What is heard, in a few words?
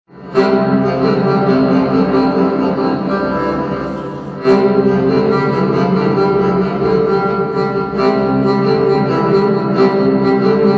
harp